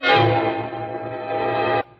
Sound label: terror